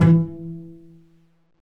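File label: strings, contrabasses pizz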